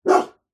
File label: animals dog medium sized single bark slight distance